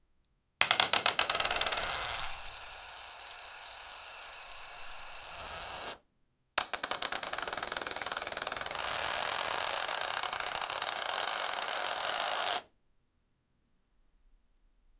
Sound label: single roulette spin; anticipation